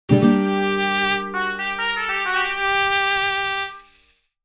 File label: Spanish transition accent ole cartoon, guitar